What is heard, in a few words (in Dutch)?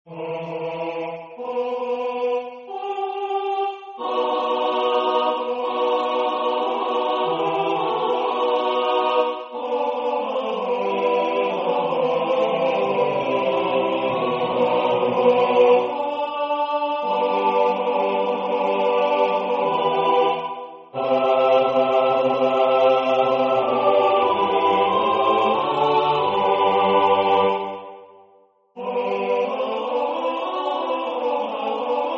Tutti; H.Purcell